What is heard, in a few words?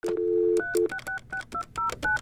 PHONES